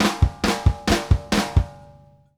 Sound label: SNARE KICK, FILLS; SP FILLS 132; Sampleheads - New York City Drumworks VOL-1